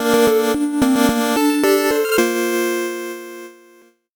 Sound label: 8bit jingle fast